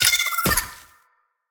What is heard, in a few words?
creature trivalve death land